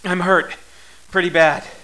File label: wound